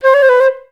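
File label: flutes C4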